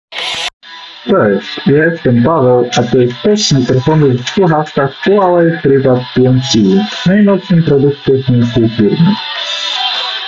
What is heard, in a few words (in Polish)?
test mikrofonow tlo; huawei freebuds 5i